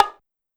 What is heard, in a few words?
Bongo Hi, Drum Machines, Clavia DDrum AT, Pitched Up; 300 Drum Machines